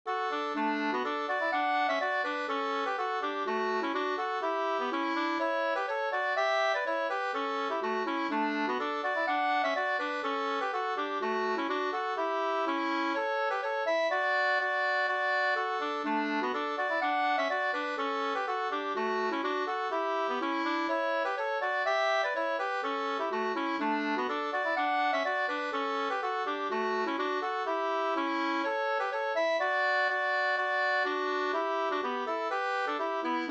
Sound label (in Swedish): schottis lybeckare; Tuven